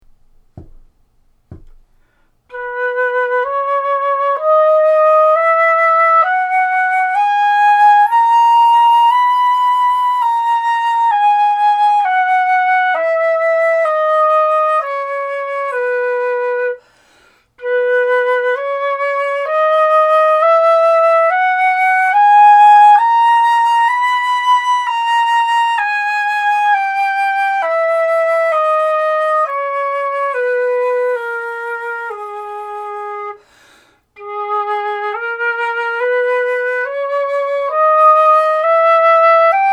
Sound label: B major g a b minor e major